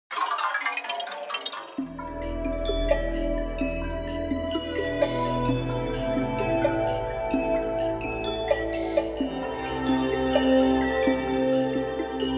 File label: technics sx-wsa1r medley; Technics SX-WSA1R MC Demo - Medley; Acoustic Modeling with SX-WSA1R; factory demo